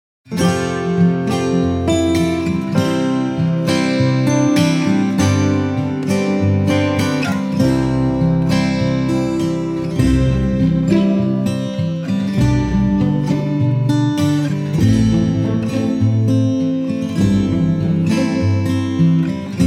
lied friede karaoke; ich und du; Schuelerzeitschriften

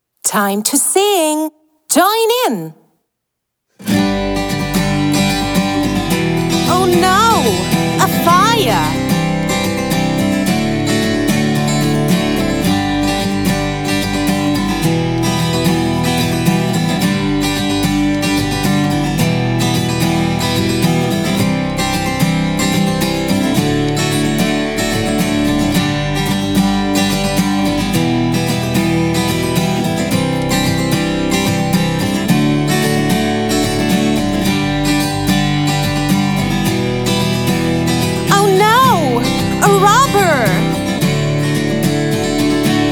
englisch song help karaoke; mini spatzenpost; Schuelerzeitschriften

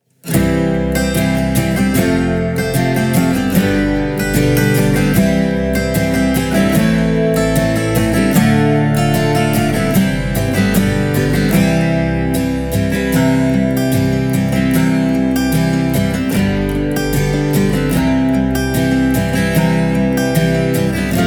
lied unser kleiner baer im wald karaoke; ich und du; Schuelerzeitschriften

